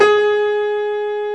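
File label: reverse G#3, piano; AKAI S-Series CD-ROM Sound Library VOL-2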